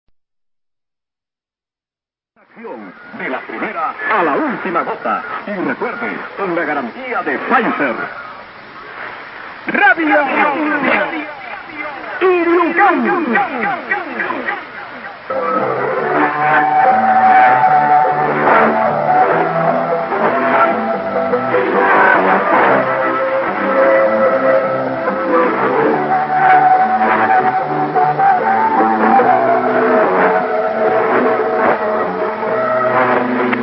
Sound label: R Ilucan Cutervo 5678 kHz; Peru